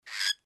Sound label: cockatiel